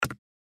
spin Click; Enchanted Prince 2; Eyecon

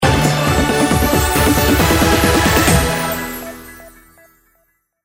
feature fanfare; Hot Off The Press

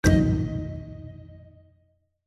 special Symbol land; Shamans Dream 2

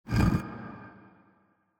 line Win in; Champions of Valhalla

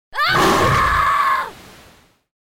intro jaguar sfx; without music

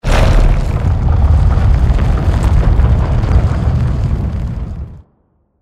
swap rumble; Stampede Call of the Pride; Eyecon

